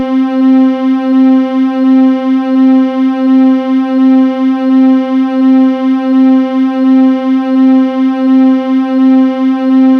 STRNG, Yamaha DTXpress

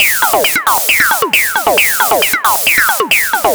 Lazer Face 135BPM, sci-fi Beats

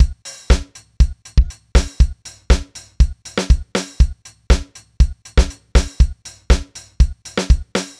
house 4 bars, Beats, Nu Jazz House, 120; PowerFX

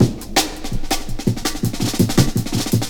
Pride Cut, Drum Loops, 165.5, Jungle

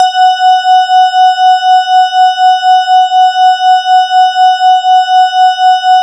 E-HARP, DX HARP; Wizoo - Powered DX

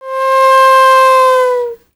AF FLUTE, Heart of Africa; Spectrasonics; Heart of Africa 2; S.AFR.FLT

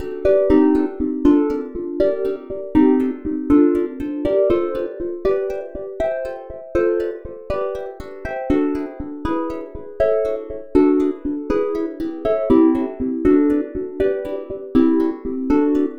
Synth Chords; Eclectic Electronica

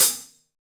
CHH, Hi Hats

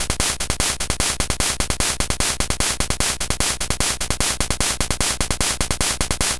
Game Boy Noise Hat Loop, 8-Bit, Drums

